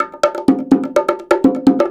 percussion loop 125, bongoloops; house; PS-28 Vocal House Samples

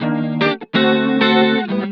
125 d, guitar licks; house; Vocal House; PS-28 Vocal House Samples; instrumentloops

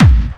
Bassdrums Clubby Kicks; Vengeance Samples

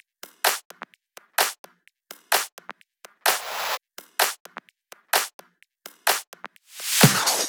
128BPM Drums; Vengeance Samples; Celebration Kit

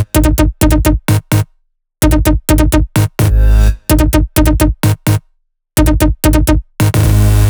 128BPM Brazil Melody; Vengeance Samples; Brazil 1 Kit

